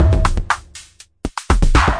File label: gentle mind ratchet, 120 bpm, drum loops and breaks